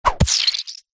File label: warfare sword or other weapon swing fast hit body squelch